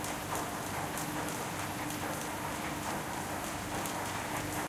escalator and rain; Golden Crayon Sounds

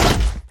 metal hard land; Original TK Sounds

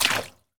water step; Golden Crayon Sounds

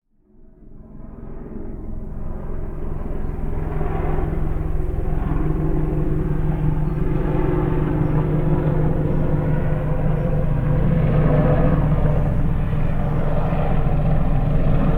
helicopter flyby; Golden Crayon Sounds

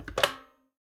telephone pickup; Golden Crayon Sounds

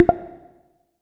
check on, ChromeVox Earcons, Reverb

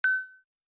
Start Haptic, iOS Sounds

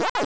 Empty Space; ESAN VO Sound Schemes; 8 Bit Sound Pack V2

